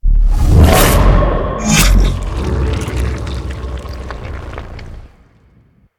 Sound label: portal; smite; cthulhu